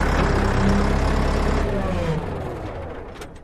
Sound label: Bulldozer Run to Off